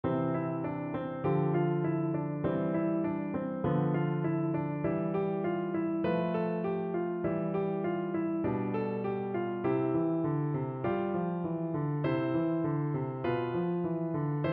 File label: piano etude